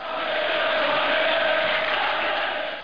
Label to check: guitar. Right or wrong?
Wrong